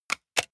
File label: plastic device handling lock double